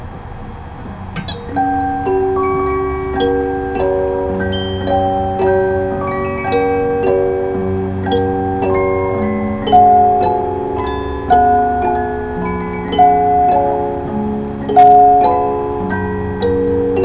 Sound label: Waltz